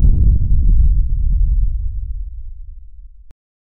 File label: a muffled soft sound of, explosion